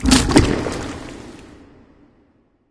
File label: Death Head; smite; god bakasura